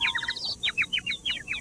birds; Neverhood

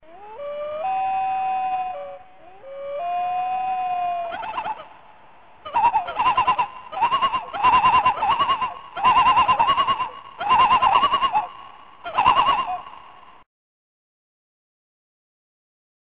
loons long; 3D Game Programming All in One; KOOB

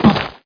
ranger falls; SpecOps2 MPlayer Demo

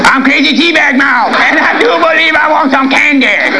Crazy Tea Bag Mouth; SNL-Misc.Sounds.Vol.#1

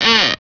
slider

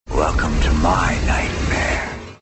New Mail

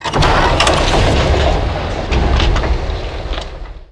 Gate Wood Open; mechanics; Spearhead Demo; MacFormat 2003 June

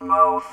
mouth; Home Gene Splicing Kit

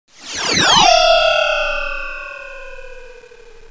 wiredspace mono, space atmo; Terra Sound Library; xlarge

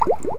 Sound btn snd; WHALE

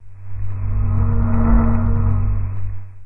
Hum Odd Rev

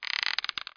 rope; Heretic II; Player

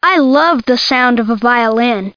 VIOLI

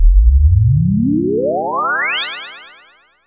sweep up

